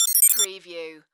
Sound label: Beep Multiple Beeps; sound effects bible